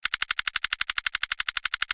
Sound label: count loop, big win